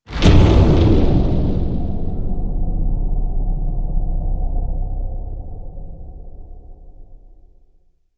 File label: FX Hit